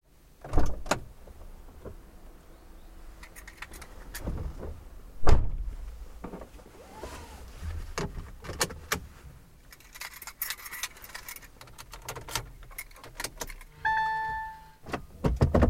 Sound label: Electric Car Start Up And Drive Away